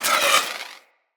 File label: Wall Hand Slide; Footsteps; Wall Run; Characters; Tenno